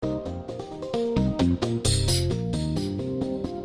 Funky 125-132 Cm7

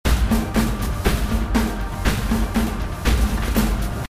120 Cm7; Triumph

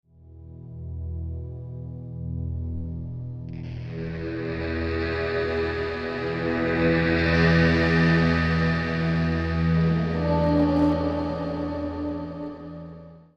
Dreamy FX

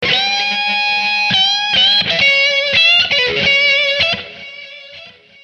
Db 95 120 lead

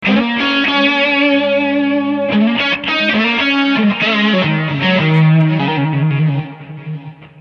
Eb 080 100 solo Wah

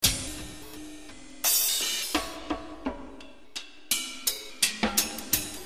Tops & Snare

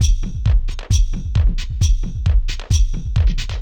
thump 133

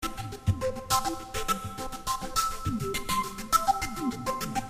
Bottles 100 Am Revb

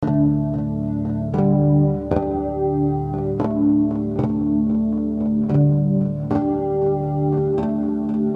Bass Amb Harmonix 115

